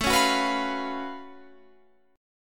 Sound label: Gb Minor Major 11th A